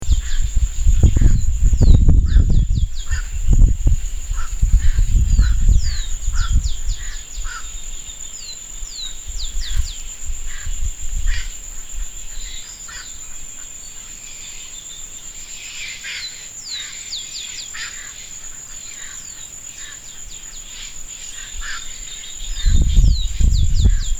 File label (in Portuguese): canto da garca azul